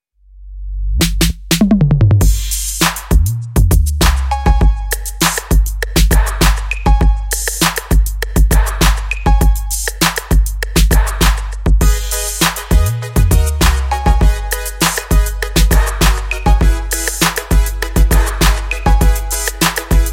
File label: Roland TR 808